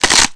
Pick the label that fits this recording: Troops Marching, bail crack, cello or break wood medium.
bail crack